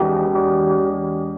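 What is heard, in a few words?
KEYS loop L, Drum & Bass 175 BPM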